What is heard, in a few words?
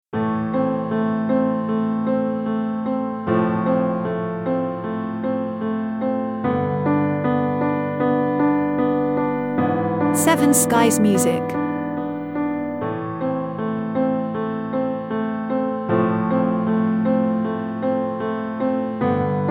Pouring Emotion